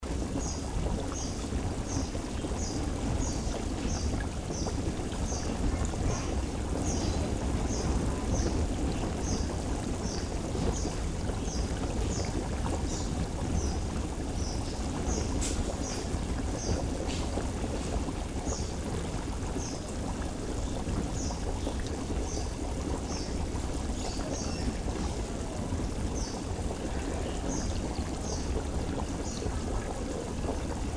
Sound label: guanziling fire water stereo